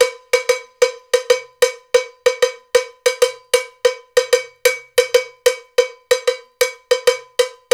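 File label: Bembe Latin 6 8; Spectrasonics - Supreme Beats - World Dance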